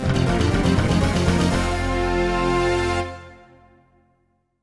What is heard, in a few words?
MISSION CLEAR (CM-64 version); Sol-Feace; X68000